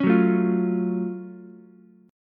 Gb Minor Major 7th Gb down Ukulele; strummed; JGuitar

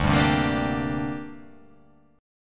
D 7th Suspended 4th Sharp 5th, down

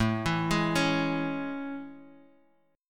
A Major 7th, Guitar Open G; chord

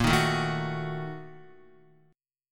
A sharp Major 7th Suspended 4th Sharp 5th, Guitar; strummed; 4F,4A,5C,5G,5C,6E; JGuitar

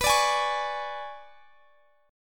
B Minor 6th Add 9th B, Guitar, Drop D

